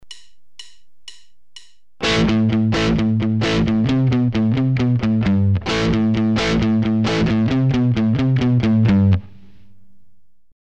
Palm muting in a hard-rock riff, guitar

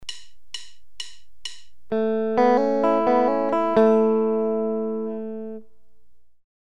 Single-note hammer-ons from open strings, guitar

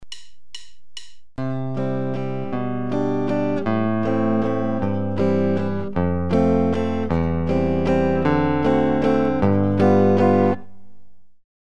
Slash chord progression, guitar

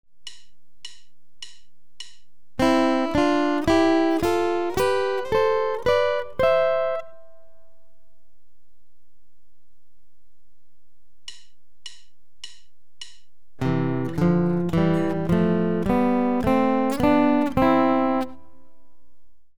C-major double-stop scales, guitar

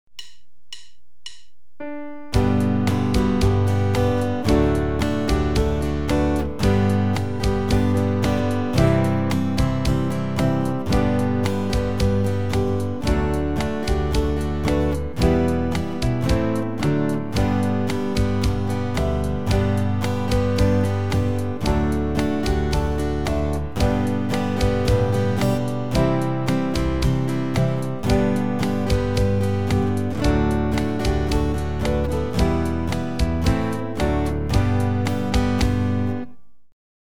Auld Lang Syne, guitar

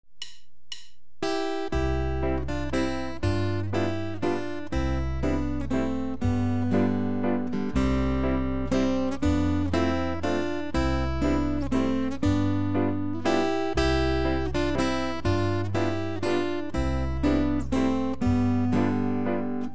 The Streets of Laredo, guitar

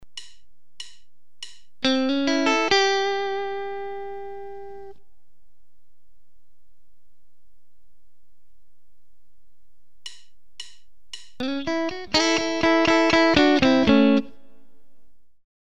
Box I blues licks with major 3rd, guitar

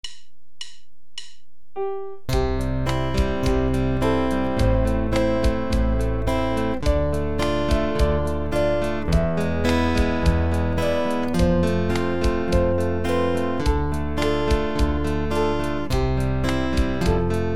The Cruel War Is Raging, guitar

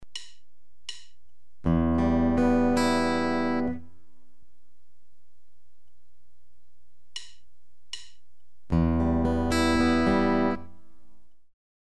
Em arpeggios, guitar

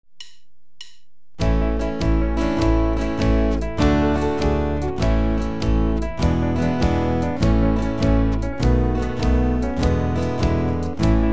Over the River and Through the Woods, guitar

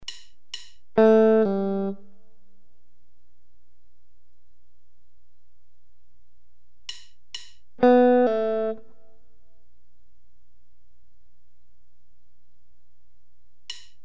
Various pull-offs, guitar

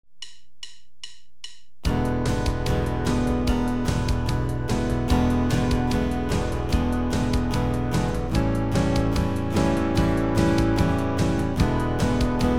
A 12 bar blues progression in E, guitar

